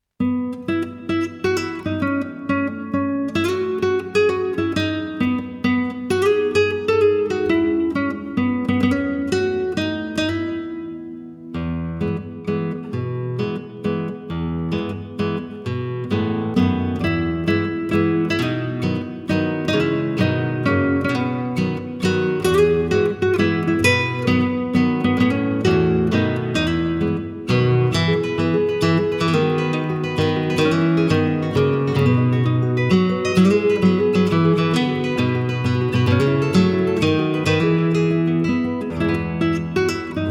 We Wish You a Merry Christmas Spanish Guitar Version